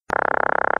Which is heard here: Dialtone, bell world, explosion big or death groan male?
Dialtone